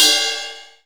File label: RIDE, MONO RIDE CYMBAL